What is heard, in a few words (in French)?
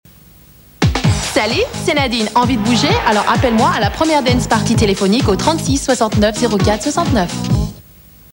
Dance party telephonique